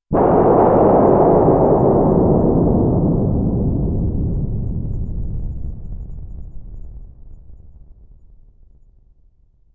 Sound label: Bomber